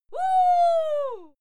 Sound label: female cheer wuhu